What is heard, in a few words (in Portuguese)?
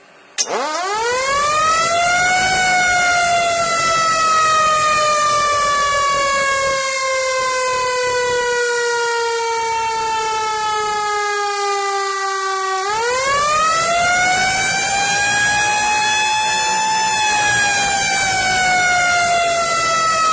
Sirene EUA